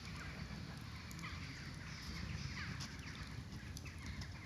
Ambi Loop, outdoor samples Ambience Loops

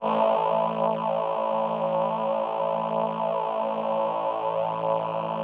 VP-330, String Machines

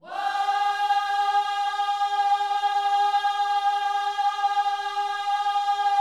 WHOA G#4, Vocal Planet, Gospel; ILIO

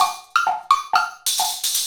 Wood Block and Crasher, Essential Percussion; Combo

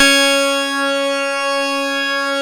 C#, PIANO; Big Fish Audio; Synclav FM

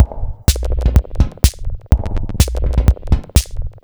125bpm, House Drumloops

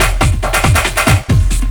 Drum & Bass, 140 BPM; Ueberschall; Drum & Bass Flash Trackz

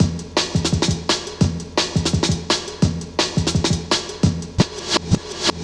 Sweet Beat, Drum & Bass; Fluid Dynamics

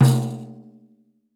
PANDEIRO

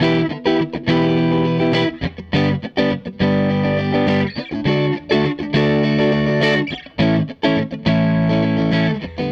Gretsch Guitar Loop

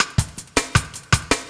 LOOP SD, 160 BPM

